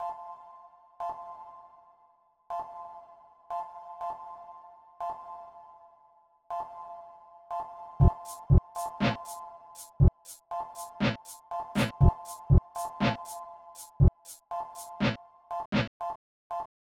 Sinewave

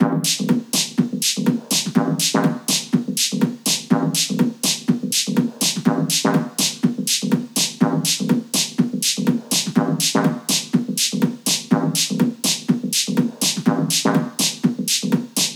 Sinewave 1KHz; 1min; Assignment

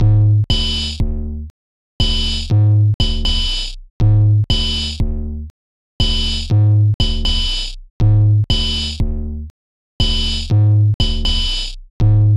Sine Wave

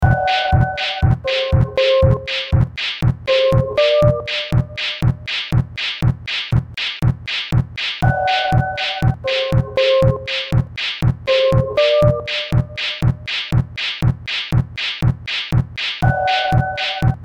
Sinewave

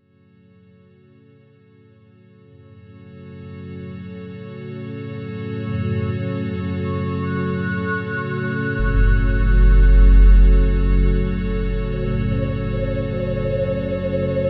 Sinewave Blues

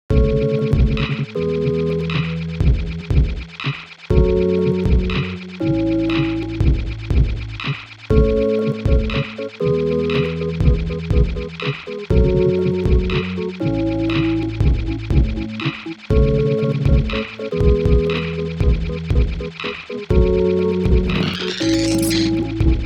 Sinewave

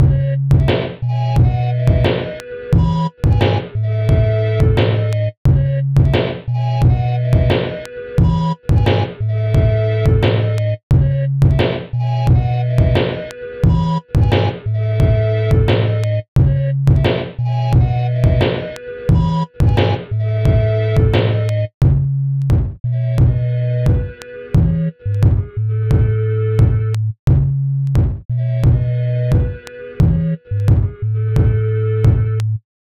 sine wave

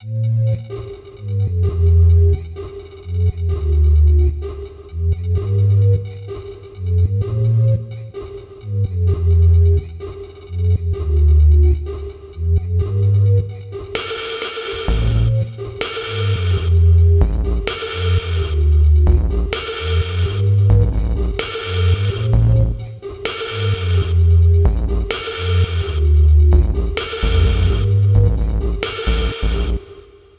Sinewave

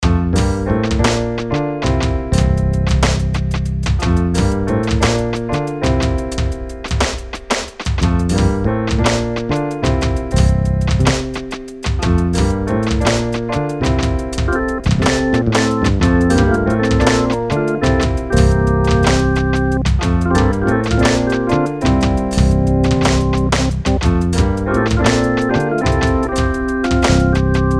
MIDI test

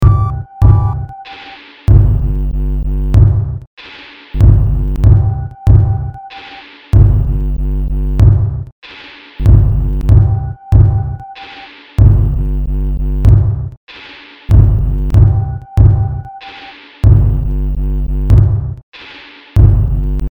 sinewave

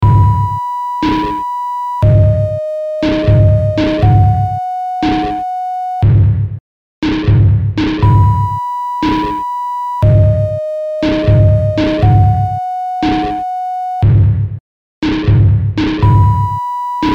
sine beat

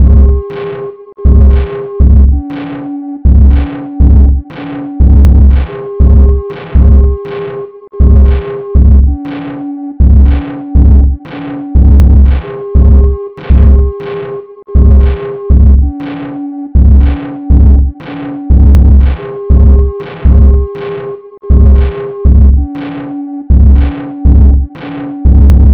Sine Wave

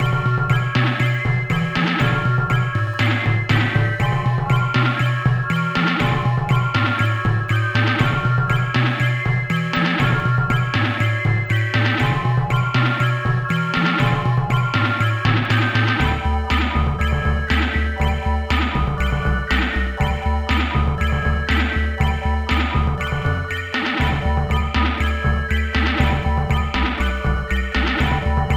Sinewave

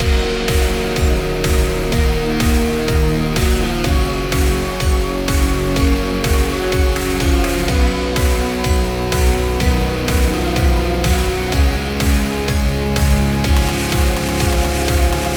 MIDI

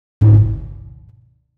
DVerb

